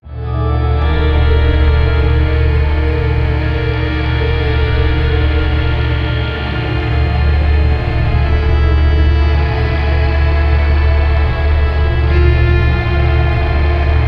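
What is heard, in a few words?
dark ghostly nautical music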